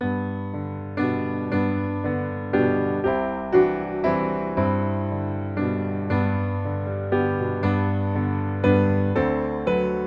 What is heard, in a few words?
piano